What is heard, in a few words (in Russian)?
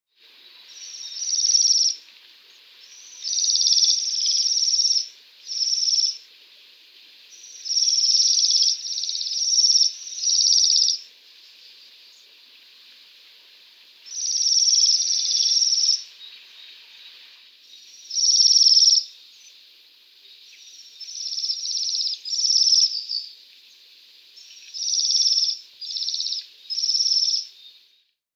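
trel sviristelej, trill of waxwings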